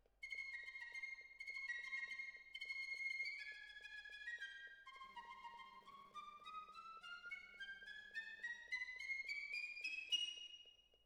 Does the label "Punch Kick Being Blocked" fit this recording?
No